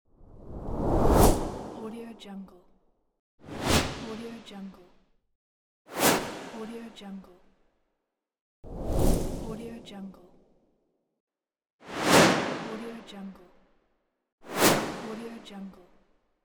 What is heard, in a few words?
swooshes